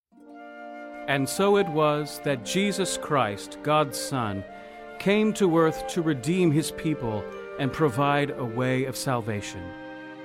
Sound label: satb